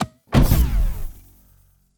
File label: Autobots footstep, Transformers; Bluezone